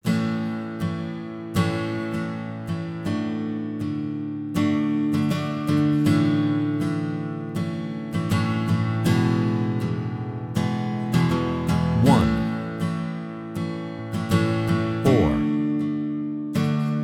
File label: I IV I V guitar A